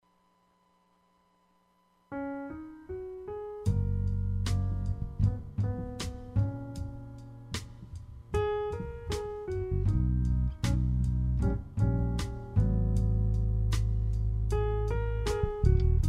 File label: jazz